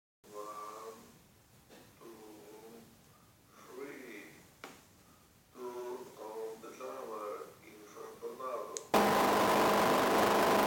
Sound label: Jammer real test 1 m; Samsung Galaxy S2 i9105P